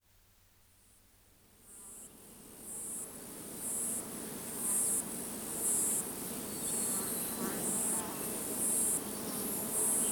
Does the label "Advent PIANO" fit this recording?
No